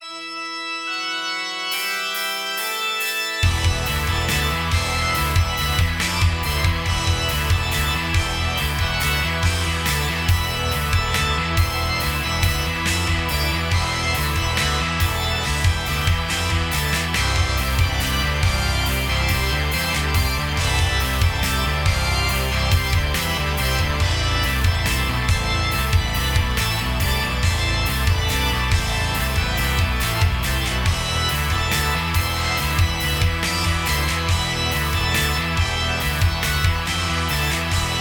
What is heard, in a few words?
Organ Metal; organteq1